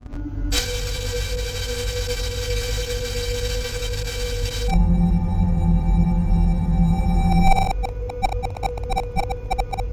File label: flat rise hi hat residue